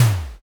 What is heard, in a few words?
wall punch